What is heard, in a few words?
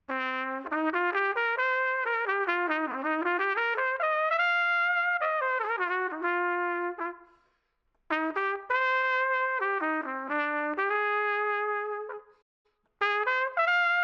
trumpet practice; raymond and ray; score